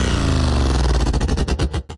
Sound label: Growl